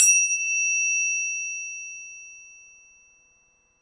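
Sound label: Yamaha RS7000 hihat cymbal, Drum machines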